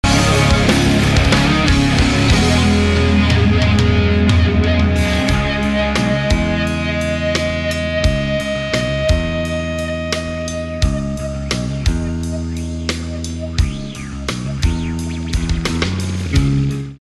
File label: Small Stone Rock